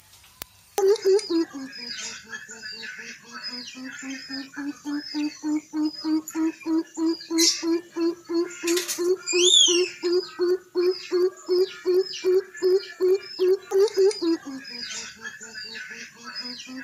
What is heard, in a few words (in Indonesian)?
suara burung bubut gacor